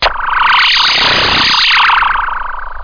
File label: Alien Cockatiel